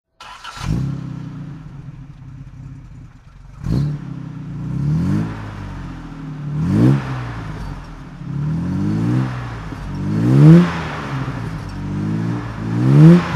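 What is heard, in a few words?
jaguar xk150 s fhc throttle; Jaguar XK 150 3.8 Litre 'S' FHC, 1959; Engine sound; Engine sound driving; V3D/ ClassicarImages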